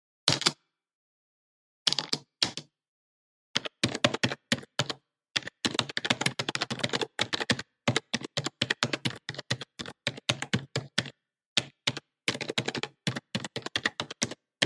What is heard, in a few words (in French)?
effet sonore informatique